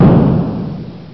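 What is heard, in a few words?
explosion; VIDEO GAMES; vanguard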